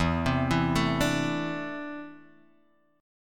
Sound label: E 7th Suspended 4th Sharp 5th